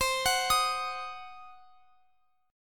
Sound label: C Diminished C, Guitar Open F; Cdim arpeggiated